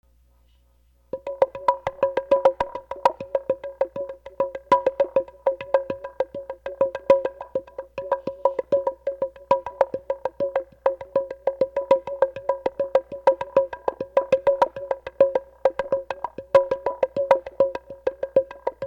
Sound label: drum